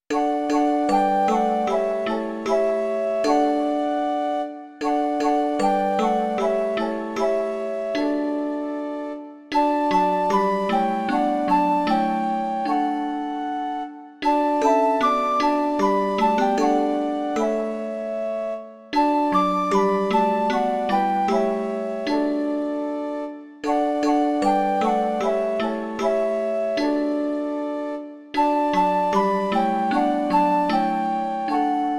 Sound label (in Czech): 2 flétny a celesta; Povězte, přátelé malí; Imaginativní verze